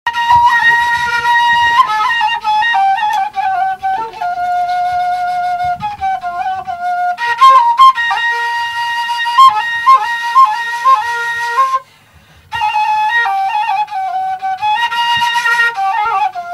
ney taleshi; نی تالشی

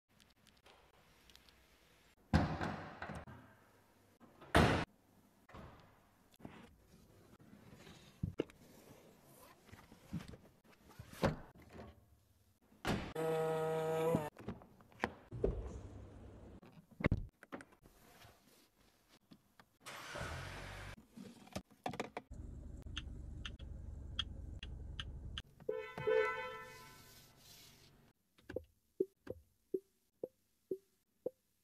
Chery Arrizo; ماشین

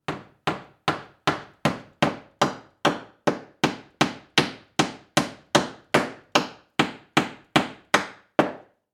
hammering nail

